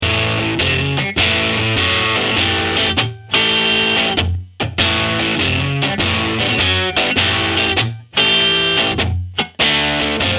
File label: riff